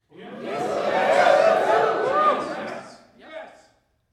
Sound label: Short Approval